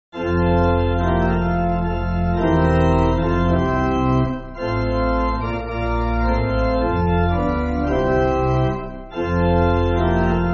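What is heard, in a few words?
Pipe Organ, Free Music Backing Files for Churches; Come Ye Weary; Messiah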